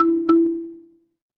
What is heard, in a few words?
feedback confirm